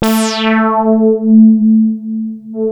Prophet 5 A4; Hollywood Edge - Giorgio Moroder Rare Synthesizer Collection

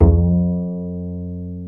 Bass, Roland

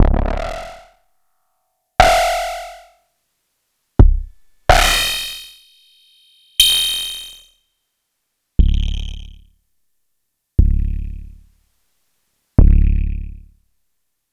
Kick Distorted Feedback

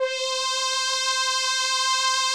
SOLINA, String Machines

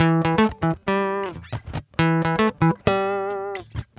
GUITAR SALSA

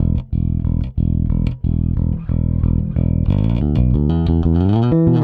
Rock, Bass; Bass X

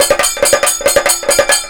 KATAK, Indian Traditions

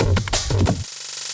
LOOP -L, Drum & Bass, 180 BPM

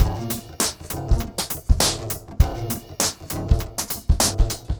Remixed Drums 100BPM; The Roots Drumloops

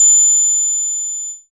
FX DRM, Analog; Sound & Vision - Gigapack II

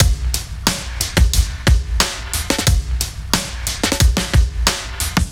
Pump Break, Drum Loops

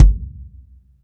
BD, Bassdrums, Roland TD-20

